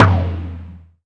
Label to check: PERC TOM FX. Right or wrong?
Right